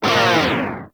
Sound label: Proj Small Fire; Warframe; Lizzie